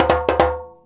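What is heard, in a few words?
100 JEMB, djembe; Univers Sons; Basicussions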